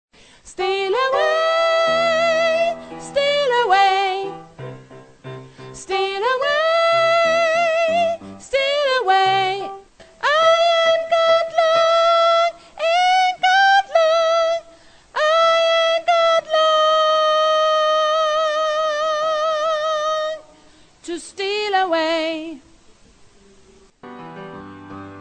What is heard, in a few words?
tenor